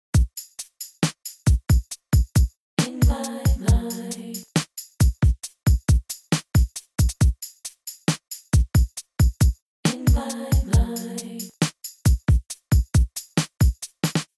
drums off

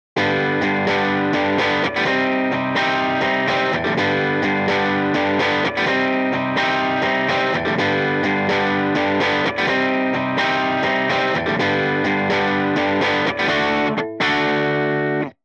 guitar On

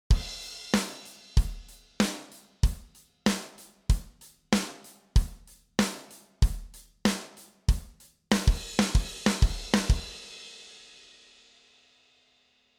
drum off

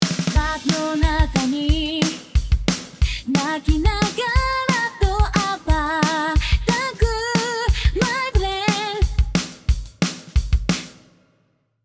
drum

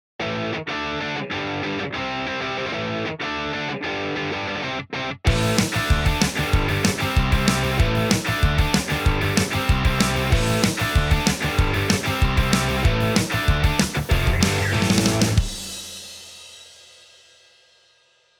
Guitar

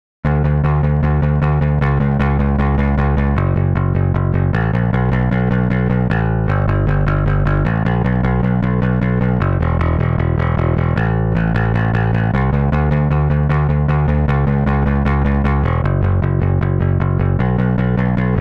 bass On

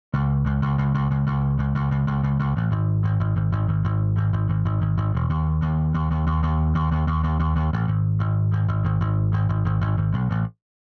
bass On

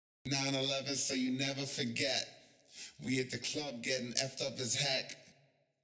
exciter On

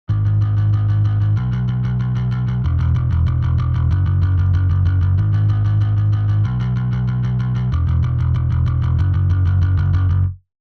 bass On

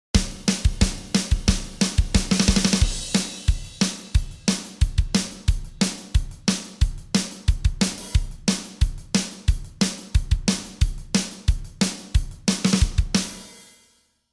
drum solo on

